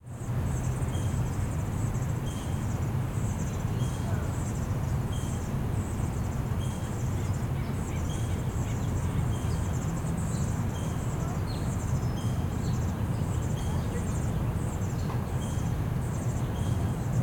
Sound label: Park Residential, Birds, Light Voices, Distant Tennis Court; 5.1 LCRLsRsLf; 2496SoundEffects; Surround In the Park 5.1